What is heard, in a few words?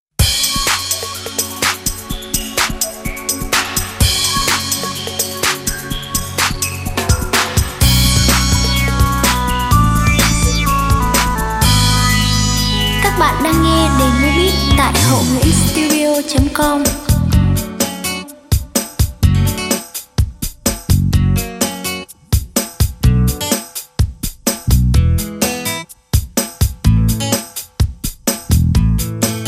beat, playback